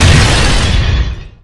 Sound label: Grenade; MilkChoco